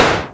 Generic Moog Snare

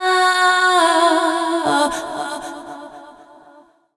Dance Vocals, FEMALE

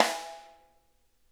rim hi R, snare; Sonic Reality; Interactive Drum Kits

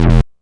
Synth Hit E

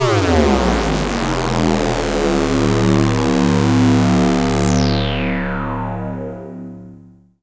GLIDE, Synth and Organ; InVision Interactive; Keith Emerson; The Most Dangerous Synth and Organ

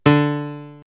House Piano; X-Static Goldmine; backtothe70's

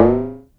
SYNCUSSION

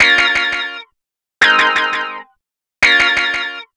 170 Ab, Guitars; Total Drum&Bass; 90 s Sample CDs

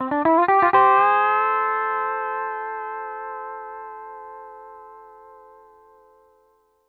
PD LOOPS 120, Whole Lotta Country

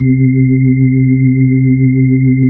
B-3 Chorus

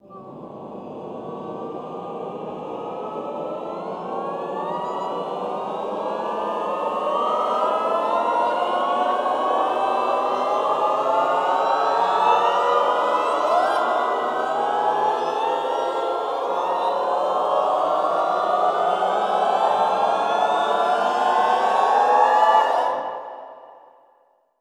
ENDLESS, Classical Choir, ENDLESS GLIS; Best Service; Extended Classical Choir

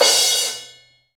Crash Stop Long, Cymbals; Roland BK-5

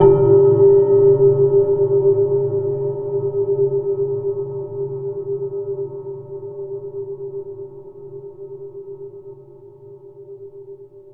Shunt Slow one shot, Metal; Sonic Foundry (Sony Creative Software) - Ambient Atmospheres & Rhythms; Ambient-Metals

